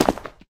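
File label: cleats concrete, footsteps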